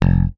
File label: PLECTRON FX F1; Xsample VOL-1; 90 s Sample CDs